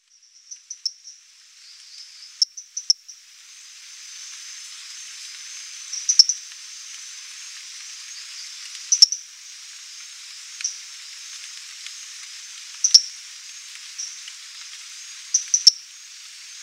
Hyla sp1

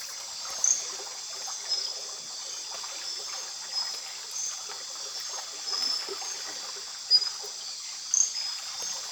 Teratohyla midas

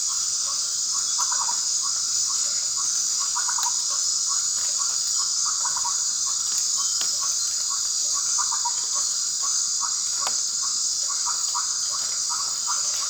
Leptodactylus fremitus

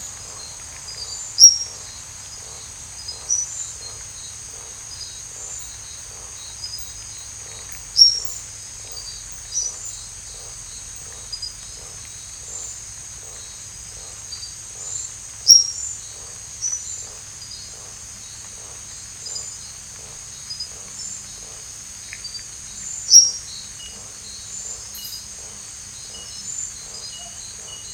Hyalinobatrachium kawense

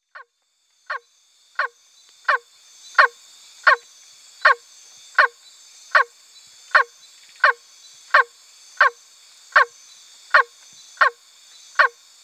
Phrynohyas sp

